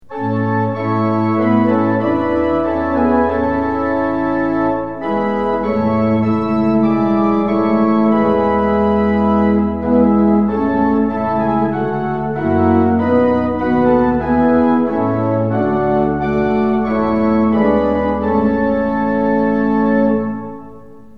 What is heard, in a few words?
tune; hymns; lillenas